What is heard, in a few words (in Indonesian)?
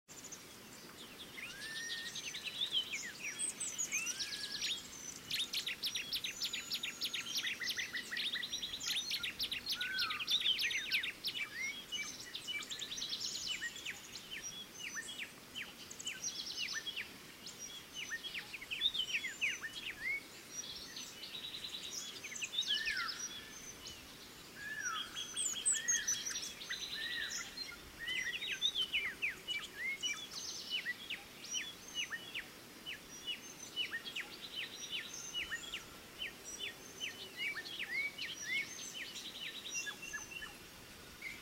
kombinasi nature